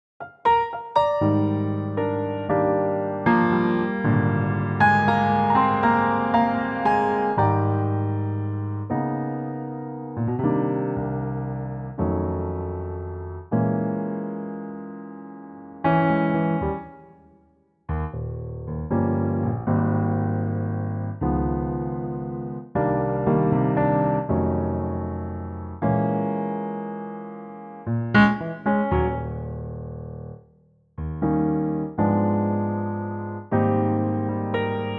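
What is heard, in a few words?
Ab Piano, Backing Tracks for Male Vocals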